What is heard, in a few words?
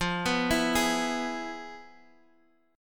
F Minor Major 7th Flat 5th Guitar Half Step Down